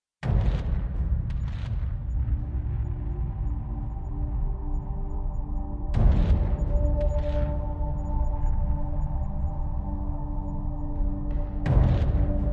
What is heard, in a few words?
Epic Emotional Percussion; Gothic Storm Music